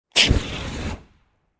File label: Rocket being launched